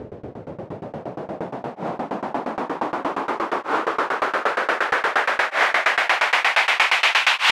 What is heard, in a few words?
Gate Sweep, White Noise